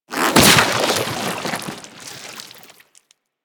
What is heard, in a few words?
Fungus Explo; Warframe; Ambience; Venus Open World; Venus Landscape